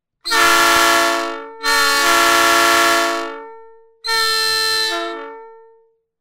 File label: train horn samples